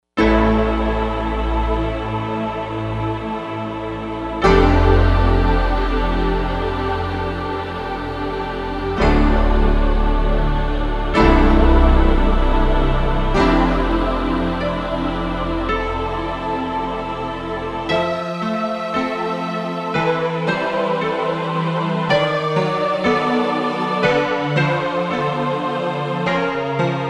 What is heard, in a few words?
Pian Or Flange, synth